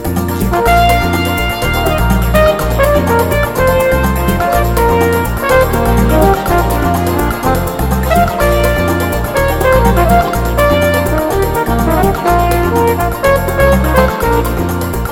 Smooth Hot Jazz

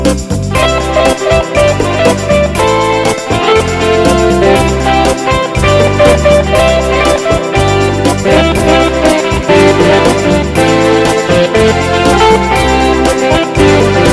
Great Hot Rhumba

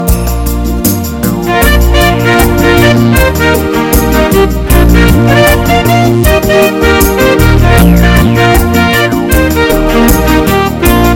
Great Bar Room Music

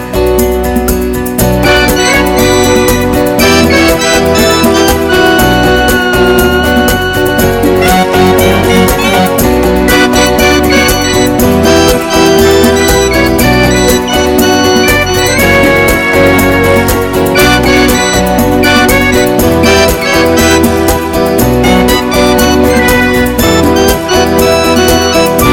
A Beautiful Beguine

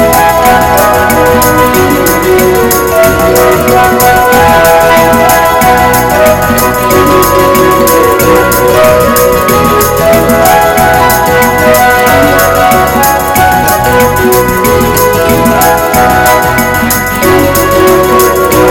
A Great Southern Shuffle

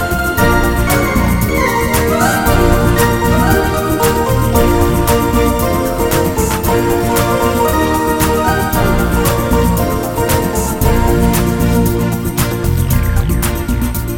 Caribbean Dancing

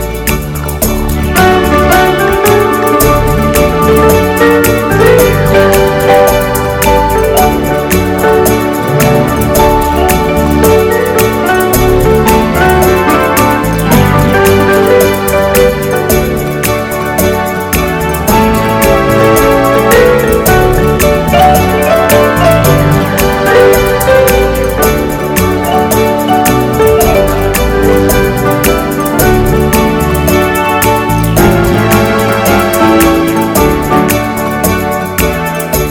Hawaiian Sounds

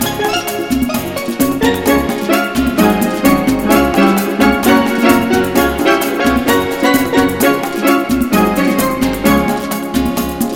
Hot and Fast Song

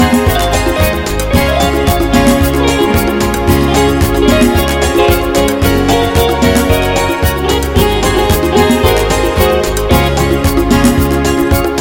A Happy Sambalegre

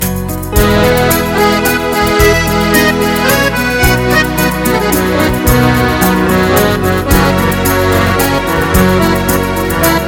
A Happy and Sweet Ballad

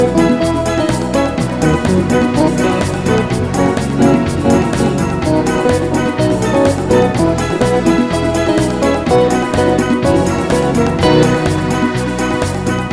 A Very Hot Salsa

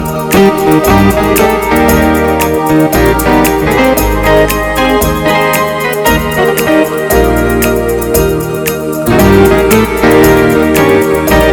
Hawaiian Sounds

